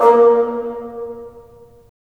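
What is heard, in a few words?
WND BN, wind bassoon; LA Composer vol. 3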